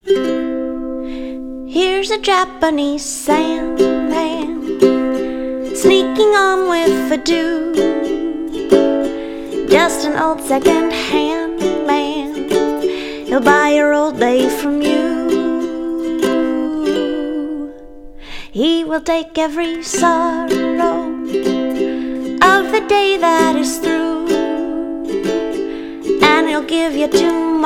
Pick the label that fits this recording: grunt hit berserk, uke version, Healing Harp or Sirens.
uke version